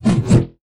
Beam Axe, Weapon

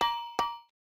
message

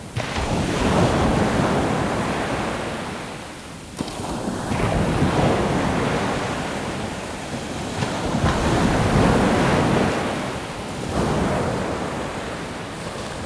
sea, ambience

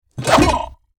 STONEKNIGHT WAR ATTACK

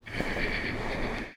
PEACE WALK

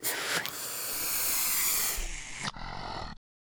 Lizard peace idle

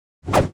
knife, Weapon

